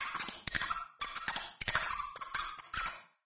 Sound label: Creature Sounds Small Rat